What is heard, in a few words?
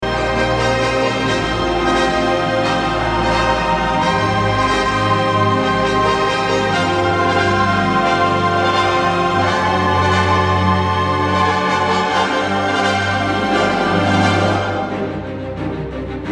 Orch